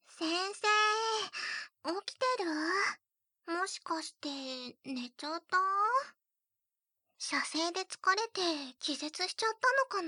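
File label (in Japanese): 両側耳舐め（効果音無し）; 学年ビリのロリギャル姉妹が毎日先生の性欲処理をしてくれるお話♪; ダチュラスクリプト; ロリギャル姉妹の部屋に家庭訪問-両側耳舐めの性教育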